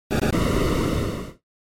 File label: SNES RPG Magic Fire